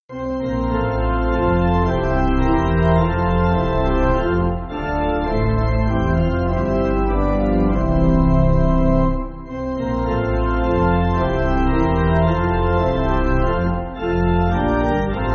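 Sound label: Pipe Organ, Free Music Backing Files for Churches; Come O Friend; Buvarp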